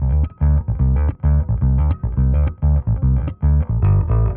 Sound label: bass bert, Loops, Phonky